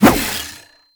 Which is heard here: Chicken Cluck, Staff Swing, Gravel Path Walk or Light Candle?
Staff Swing